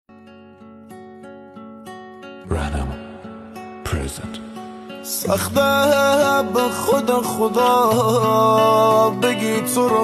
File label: Pelak Guitar Version